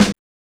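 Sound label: house snare drum; E-Lab; X Static Goldmine 1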